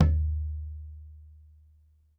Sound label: Tom Acoustic Room